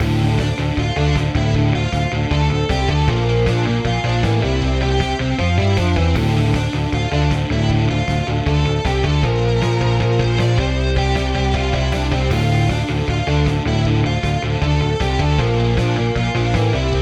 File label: final boss battle remake